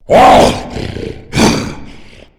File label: tank voice, zombie; Left 4 Fortress